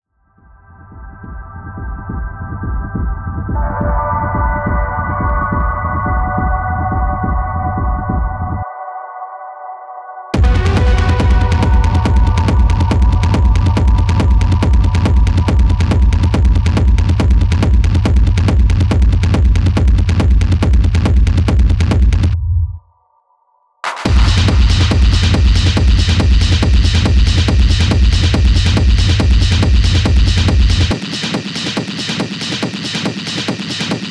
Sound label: Rave Techno Template 140 BPM; EMS; Ableton